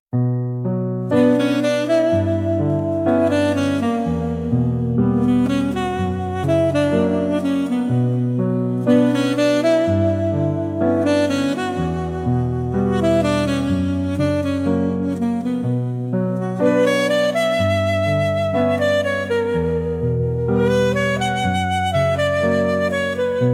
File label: smooth sax journey